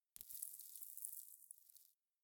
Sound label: Dust Fall